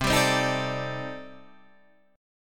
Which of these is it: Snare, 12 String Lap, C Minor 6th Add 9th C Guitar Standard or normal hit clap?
C Minor 6th Add 9th C Guitar Standard